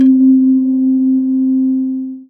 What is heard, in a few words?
Dance Mega Synth; Best Service; 90 s Sample CDs